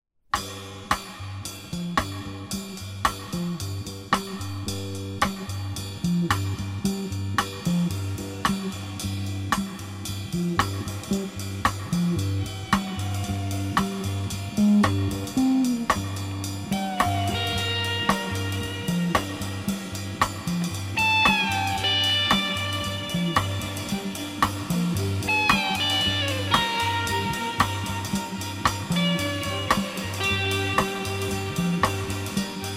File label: Dramatic Theme